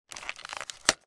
CAMERA VIDEO

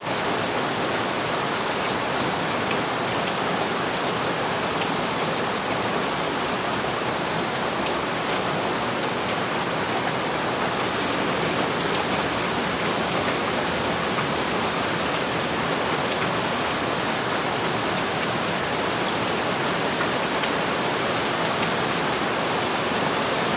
rain hard

